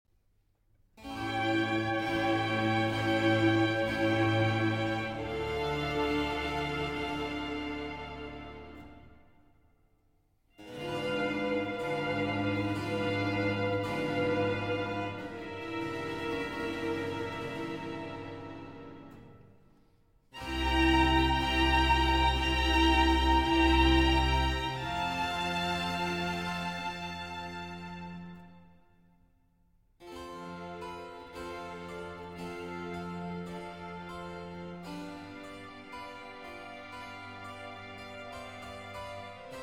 Concerto for 2 Trumpets, Strings and Continuo in C Major; R.537; Vivaldi